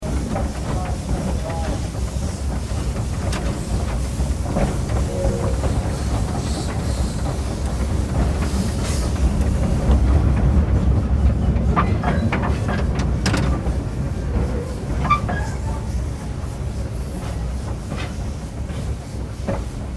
steam train

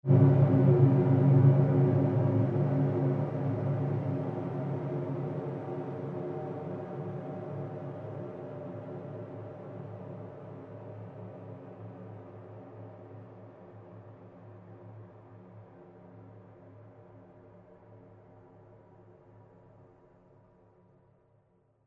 created pulse fade long stereo

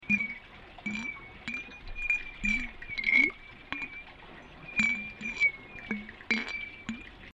cymbals tibetan strike submerged pool

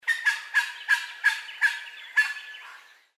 bird lithgow call

